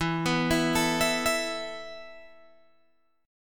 E Suspended 4th E, down, Guitar, Open G

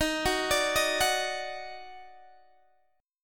Eb Minor Major 7th Eb down Guitar DADGAD, chord

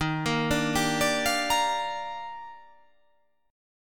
D sharp Major 9th

